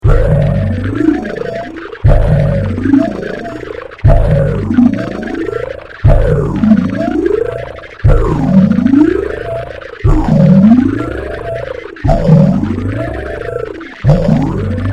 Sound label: loop